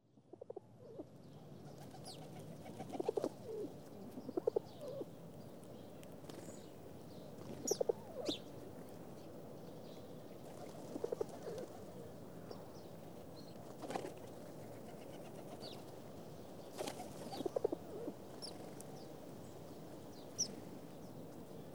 Atmospheres Pigeons feeding with distant traffic; Cities; The BBC Sound Effects Library

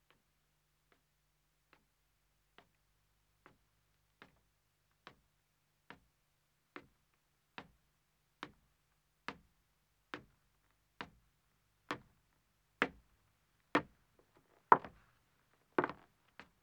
Wooden Stairs Ascending, 1 Man, footsteps; BBC Sound Effects Library

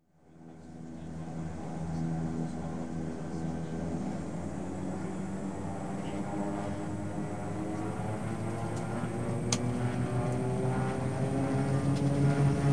airliner take off, constant flight; Transport; BBC Sound Effects Library

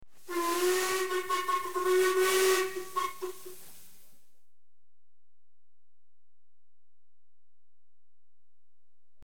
Engine Whistle (LMS); BBC Sound Effects Library; Age Of Steam

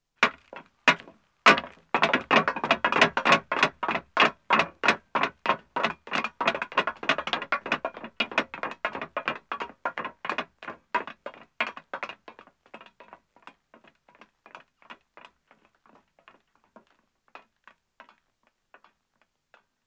Metal Stairs Descending, 2 Men, footsteps; BBC Sound Effects Library

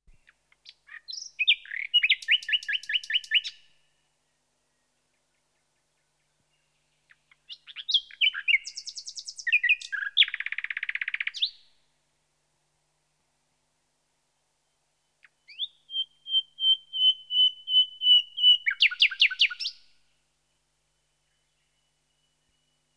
Nightingale (Luscinia Megarhyncho; Birds; The BBC Sound Effects Library

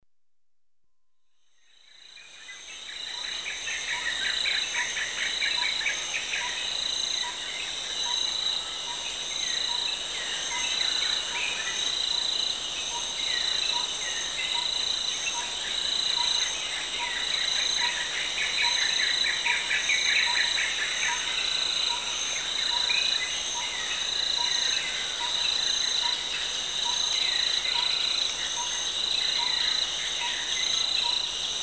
Birds & Insects, mid morning rainforest; The BBC Sound Effects Library; Africa- The Natural World

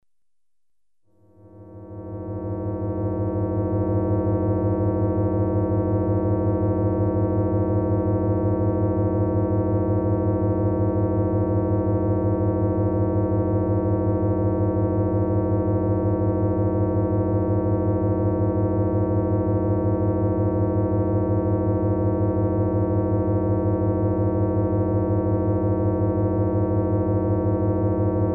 Generator hum, Electronically Generated Sounds; Sound Effects Library

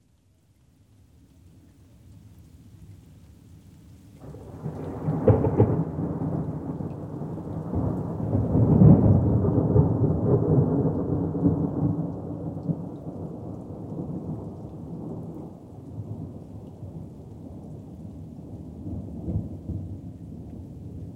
Thunder roll (with rain); Weather; The BBC Sound Effects Library

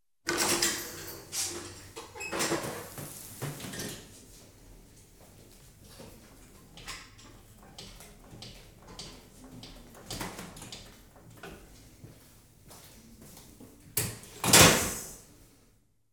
Hydraulic bed raised, Hospitals; BBC Sound Effects Library

